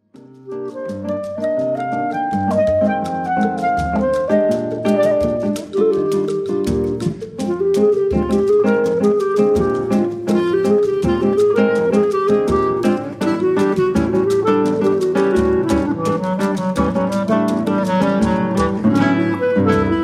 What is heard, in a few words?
sambossa